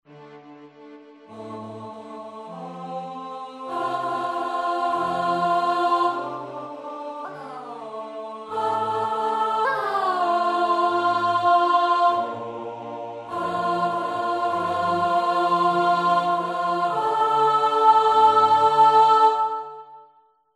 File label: Chorus Alto